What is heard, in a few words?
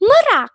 merak